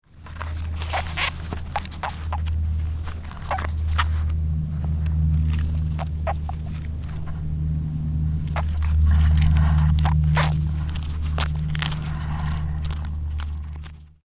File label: mazama pocket gopher whimpering and scratching